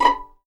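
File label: B5 pizzicato MF, violins; East West; The Ultimate String Collection; VLNS PIZZ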